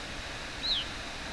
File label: Gray-cheeked Thrush; NY